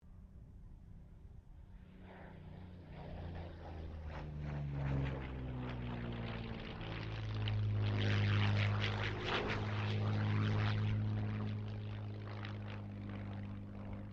AIRCRAFT YAKOLEV YAK-9 PASS, Russian Yak-9 fighter plane; Airplanes; Vehicles